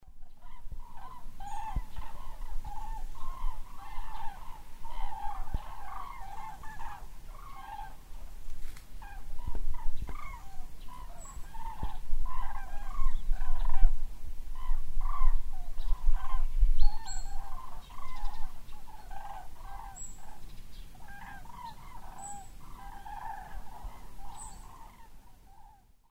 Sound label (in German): Kraniche über Lochow